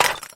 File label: toilet bullet hit